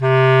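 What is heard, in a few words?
C2 BASS CLARINET; ORCH.COMP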